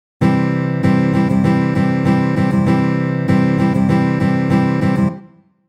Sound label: stroke, guitar